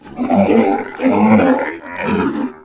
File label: Seal Mutant Death; Playable Tutorial Level; TR3